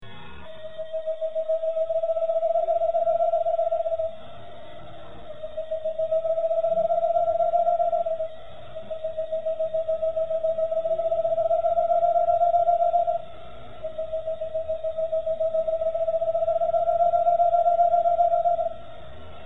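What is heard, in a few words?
Boreal Owl contact call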